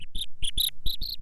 tech fx; Best Service; Hyper Dance; EFFECTS

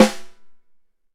Ludwig Snare; Digital Sound Factory

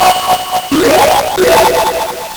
Nintendo Gameboy LSDJ

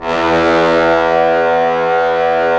BRS Tbns; Roland - LA Composer vol. 4

